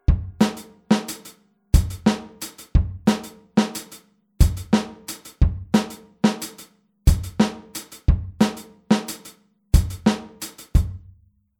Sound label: Groove off; Hier spielen wir den Offbeat mit der rechten Hand wieder auf dem HiHat